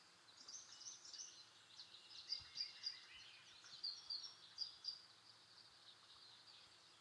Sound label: Ambience Plain; Inotia 3